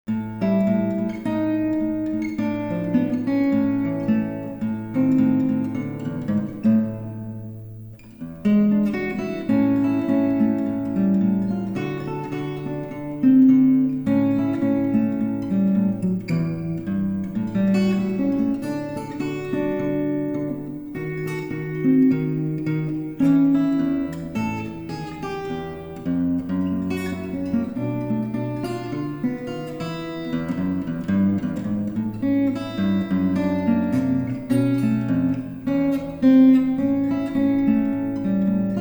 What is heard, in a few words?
blues en la